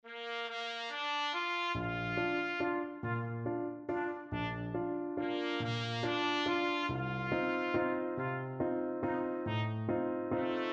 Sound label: blue danube, trumpet